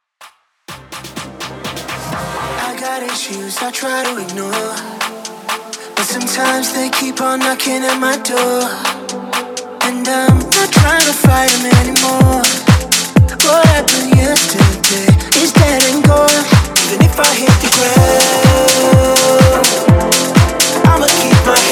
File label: Dance ReDrum 125 BPM; Top40s